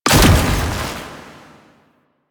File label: Shield Activate; Warframe; Nineteeen Ninety Nine; PvPvE